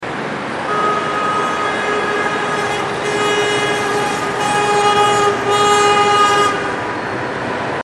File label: car passing with horn